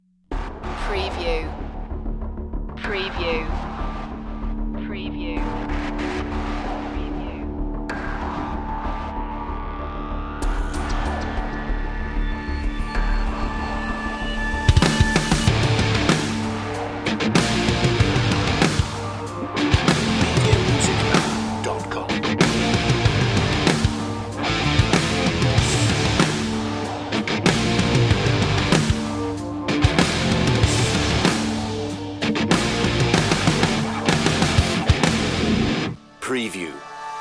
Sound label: white noise underscore version